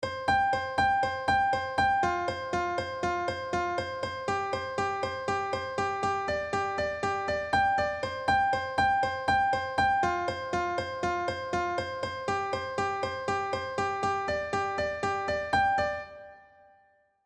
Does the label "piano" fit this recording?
Yes